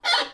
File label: Violin Screech Noise Short; soundscrate